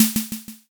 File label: snare